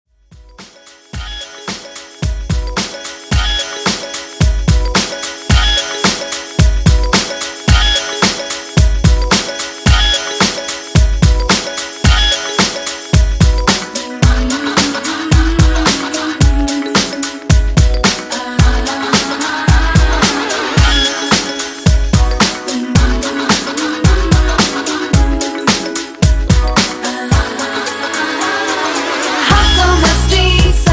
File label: 110 Bpm; DJ In Outro; Redrum